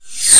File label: flip